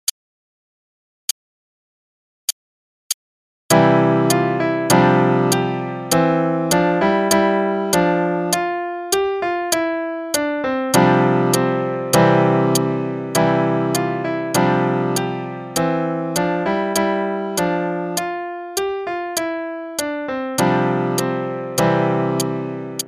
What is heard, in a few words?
Gavotte (qn=100); Faber Adult All-in-One; Adult All-in-One Book 1